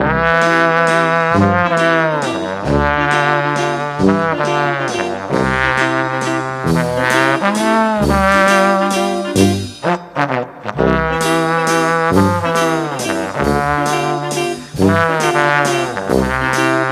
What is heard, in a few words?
cake drop music; Birthday Party Bash; Wii